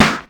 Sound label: Clap Snare, Ensoniq ASR-X Pro drum machine; 300 Drum Machines